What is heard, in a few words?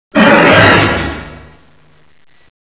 scary strike